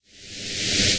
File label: Inhale